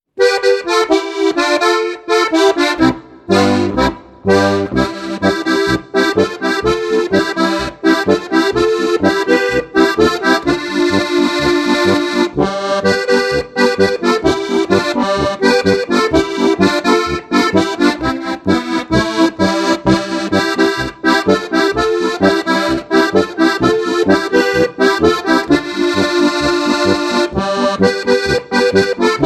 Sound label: polka